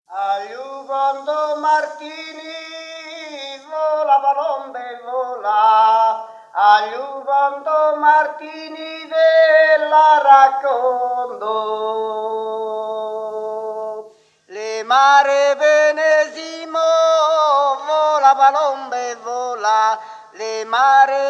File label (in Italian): Canto Di Mietitura